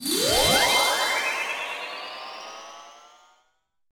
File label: level up; Korogashi Puzzle Katamari Damacy; DSiWare; NDS